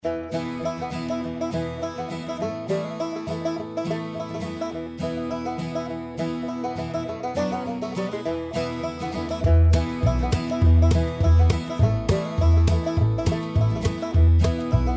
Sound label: Bluegrass with Banja and Violins; Railway Empire